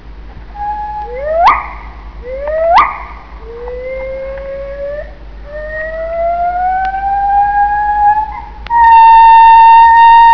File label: Fem, gibbons